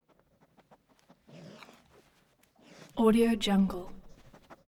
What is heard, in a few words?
animal dog stafford sniff; smartsoundfx